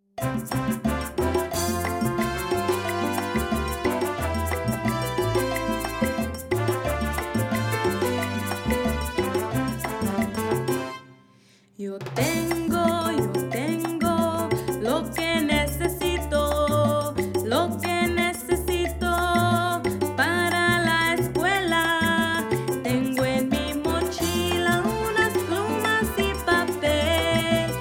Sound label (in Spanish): Yo Tengo Lo Que Necesito (Vocals)